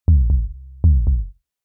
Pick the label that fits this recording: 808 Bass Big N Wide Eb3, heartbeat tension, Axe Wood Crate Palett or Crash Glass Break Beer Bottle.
heartbeat tension